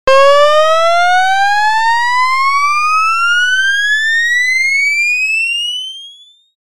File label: meter up